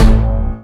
Hit

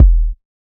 808's

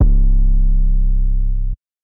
808s

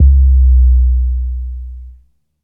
808 C

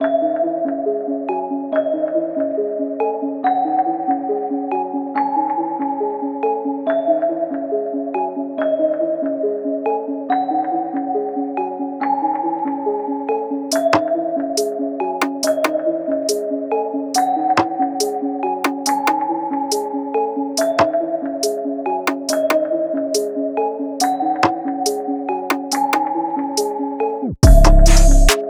Beat

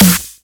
Snare

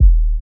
Sub, 808s

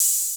Open Hat

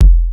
KIK

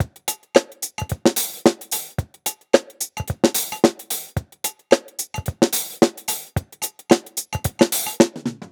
110bpm kick tops; Unison Funk

